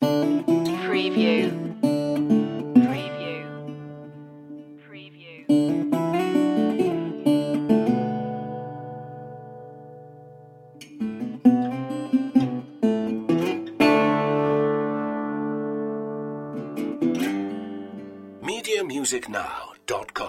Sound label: sand and rust loop